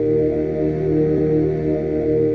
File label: monk loop, MALE SINGING; VOCALS and SPOKEN WORD